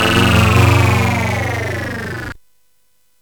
Tube, CSG8580R5

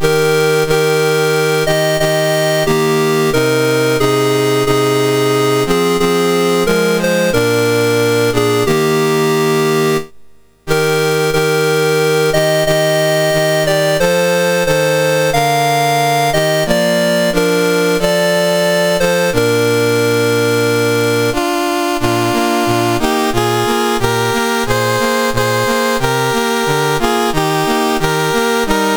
Luxembourg, National Anthems; Dave Marquis

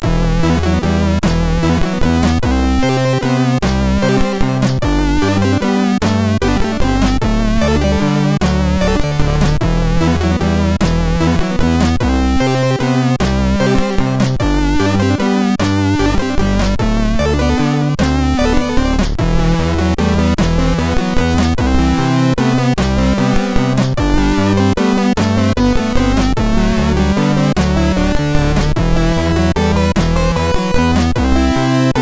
MOS6581; Three-Headed Boy

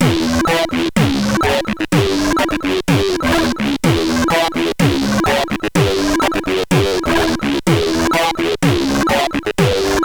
Heavy Hit, CSG8580R5; hvsc

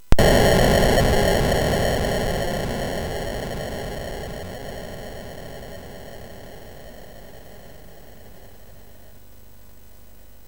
Frantic, MOS6581R4

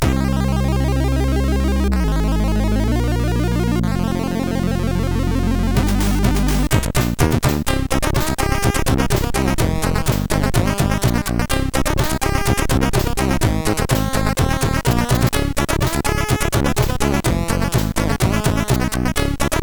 MOS 6581R4; sid

